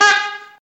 honk; phantasm

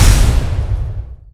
explode; bloodsucker

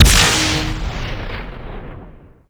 railgun bigfire

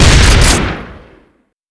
scatter gun shoot

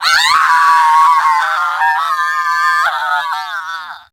death scream

